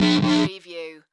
Electric Pulse Double

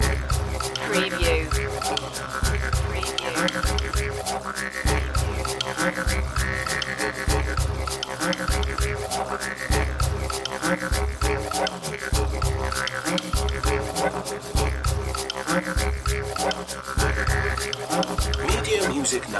australian ancestry loop; aboriginal music

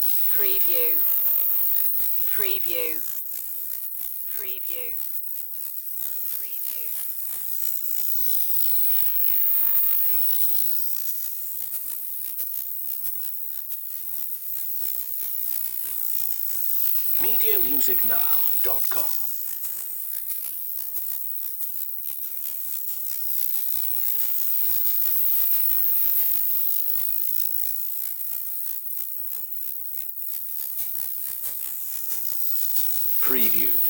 Synthetic Static

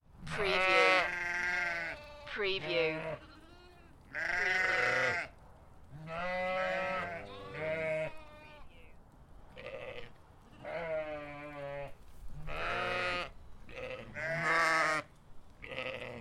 Animals Sheep Farm

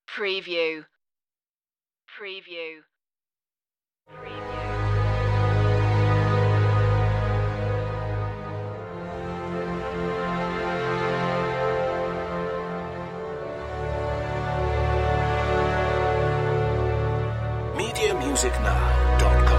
orchestra only

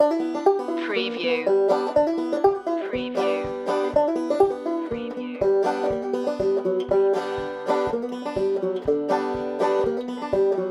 turkey shoot

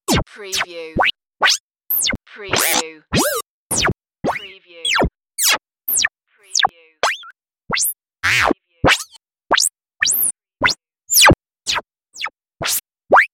space game sounds

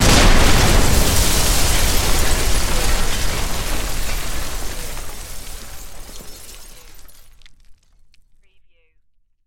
Explosion Building; Sound Effects Bible

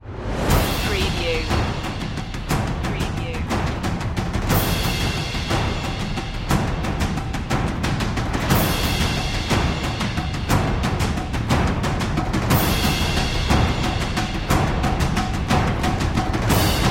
percussion only

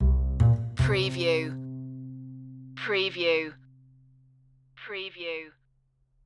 smooth approach loop

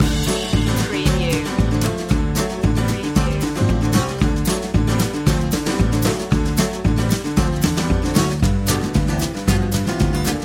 ramblin road loop

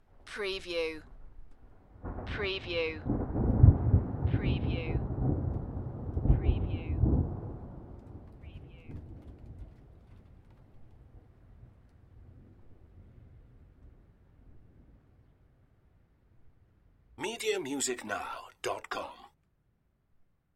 Thunder Lightning Storm Medium Distance

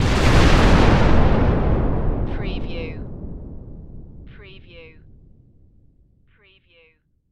Explosion Massive; Sound Effects Bible

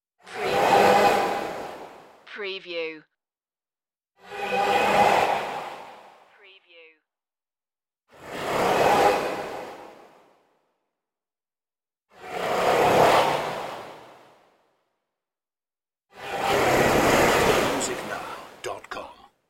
starship crashing whooshes

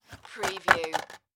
Makeup Kit Open Case; sound-effects-bible

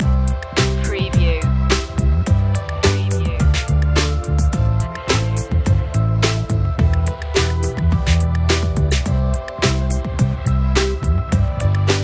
sweet summer night loop

